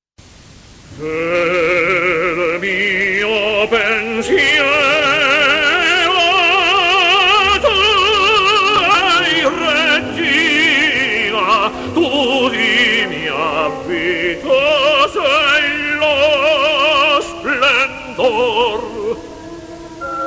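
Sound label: Brass Playing Mishaps; Verdi - Aida - Un tenore in difficoltà nella prima aria dell'opera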